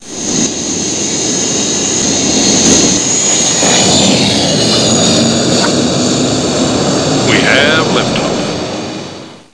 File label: blastoff